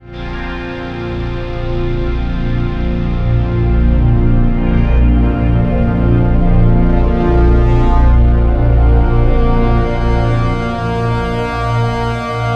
Boss Resolution; Torchlight II

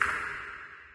distant drip, water; Garry's Mod; hl2

